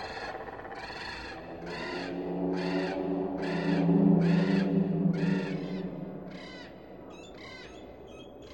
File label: Volcano Mountains, ambience; Volcano Mountains 03